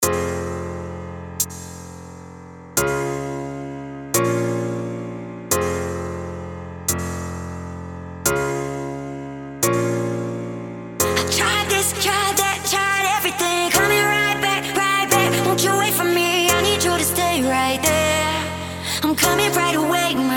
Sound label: Drum Bass